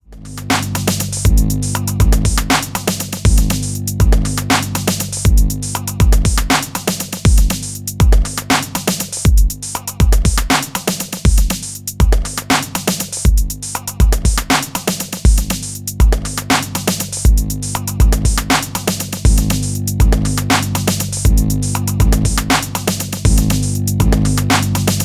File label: Adjusting BD; Wave Alchemy Triaz